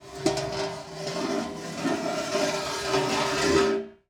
Dragging Metal Plate; Sonic Bat; Videogame Foley Essentials Vol. I

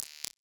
Hi Perc, Data Mosh Hits; Hats - Shakers - Ride - Cymbal; 99Sounds; Mangling Audio

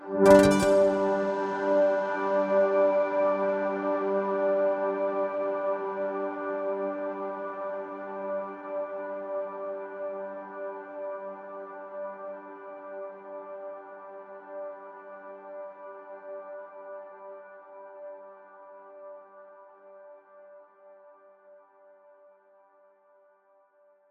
Stringy G, Simply Space

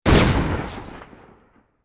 Bullet Time; FXHome; Bullet and Gun

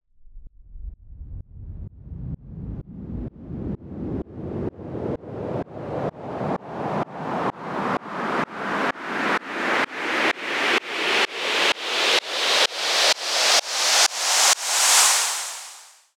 Medium Sweep, White Noise

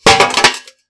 metal Pot; Kenney's Sound Pack; RPG Audio

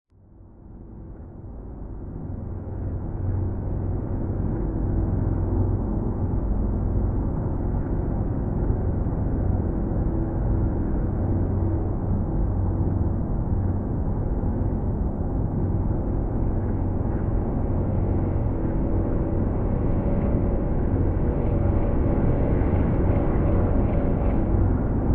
SPACESHIP INTERIORS LOW; Filmnoise Inc

